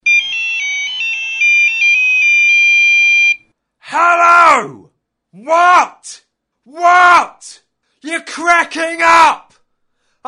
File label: sms tone